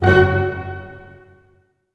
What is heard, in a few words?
STAC F#, ORCH STAC; Sound On Sound - Peter Siedleczek's Orchestra